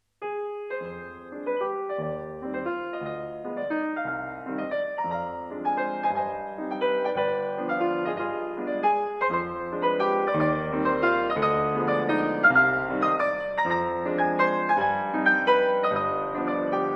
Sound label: Carnaval Chiarina; Op.9 (Sc nes Mignonnes Sur Quatre Notes)